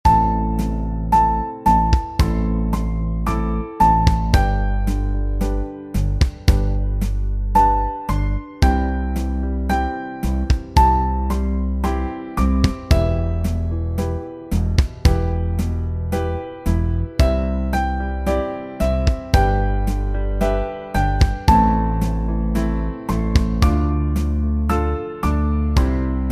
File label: legato; PSE